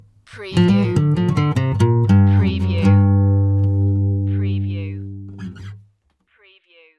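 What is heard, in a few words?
Acoustic Blue Riff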